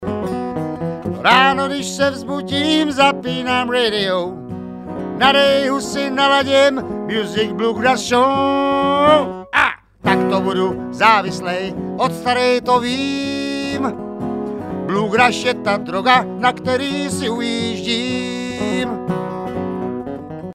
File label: vocLead, bluegrass